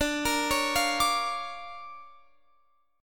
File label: D Minor Major 7th Sharp 5th, D, down, Guitar Open F; arpeggiated